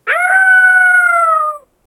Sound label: the cry of the boar